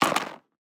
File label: DICE on Cardboard, Throw and Roll, Standard, 16 Sixteen Dice; Samples; ArticulatedSounds; DICE Sfx Party (Extended)